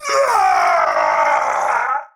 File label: Death, Battle; en; Tau; Tau Dax Knight